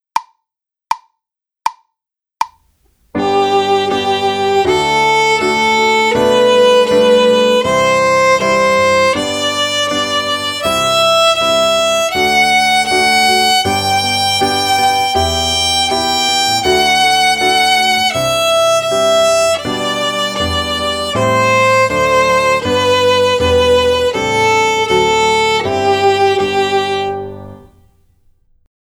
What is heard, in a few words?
G Major Scale